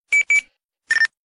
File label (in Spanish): intercomunicador